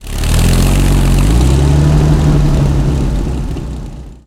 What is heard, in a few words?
comet engine load, tanks; engines; War Thunder